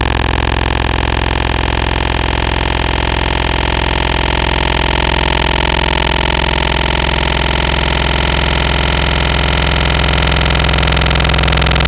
Feedback, Wavetables; Antidote Advent

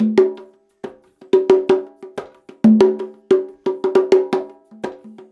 CONGA, Percussion Loops; USB Soundscan vol.36; AKAI; 90CONGAS

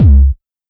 Quasimidi 309, Drums

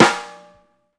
DEAD, BLAK TAMA; Sampleheads; New York City Drumworks VOL-1; SP BLAK TAMA

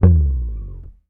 HOFNER, Bass; Sampleheads; Will Lee Bass Library; HOFNER SUS

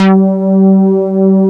G3, SYNTHESIZER; AKAI S6000 CD-ROM - Volume 1; GLUNGE

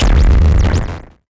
Cwejman S1 Rumble

